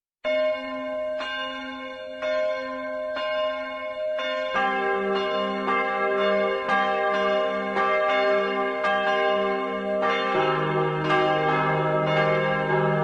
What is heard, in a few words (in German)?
Glocken Vierer